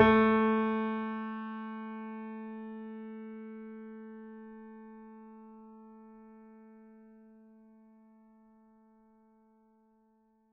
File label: mini grand; bananenkeyboard